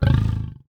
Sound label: Zombie